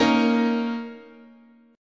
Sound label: A sharp Minor Double Flat 5th, guitar chord